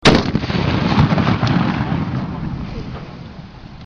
снайперская винтовка

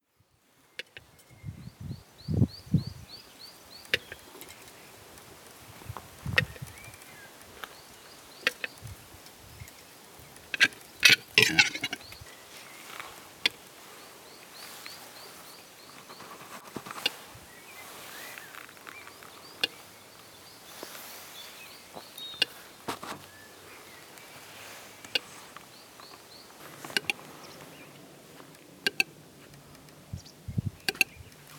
gluhar v lesu

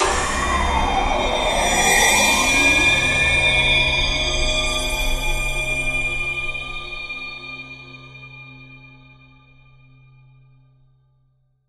Zvuk trevozhnyj - Strashnyj Zvuk Iz Filma Uzhasov nagnetayucshij

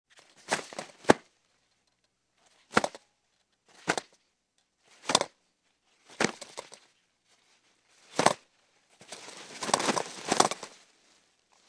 zvuki tualetnoj bumagi

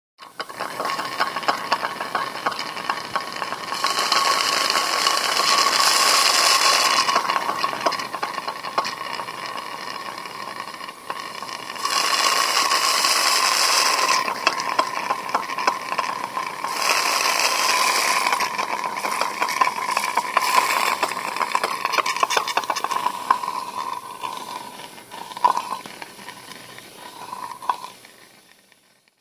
zvuk hirurgicheskogo otsasyvatelja

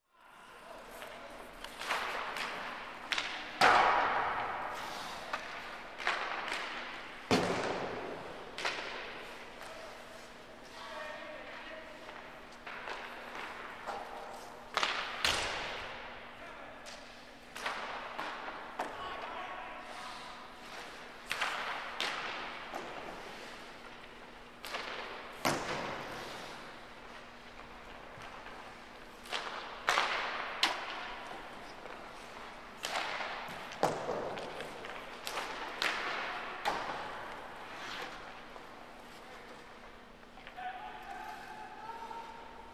zvuk hokkejnoj trenirovki

